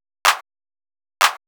HOUSE CLAP 125; Beats

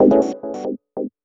TECHNO 140; Techno2

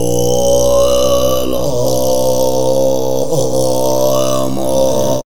monk, ETHNIC STUFF

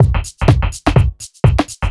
HOUSE BEATS 125

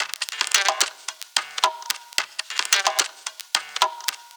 110 Halftime Percussive, Percussion; Loops; Touch Loops; CosmicFunk

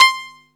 C5, SYNTHS; OBMX

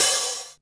OP HHAT; drum

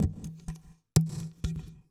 PERCLOOP ETHNO 125

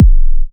Minimal Kick, Drums, Kicks

